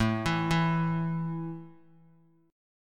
A 5th A down Guitar; arpeggiated

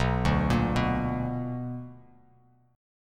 B Minor Major 7th, down, Bass Standard; arpeggiated; JGuitar

